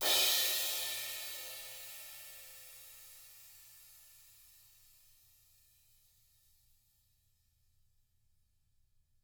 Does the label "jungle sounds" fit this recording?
No